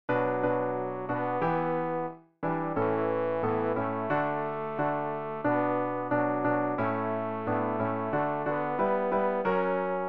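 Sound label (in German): tenor, Übehilfen für das Erlernen von Liedern; ref gesangbuch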